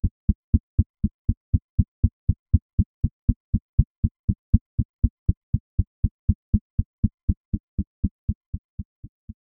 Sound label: a single heartbeat